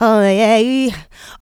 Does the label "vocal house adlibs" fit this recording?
Yes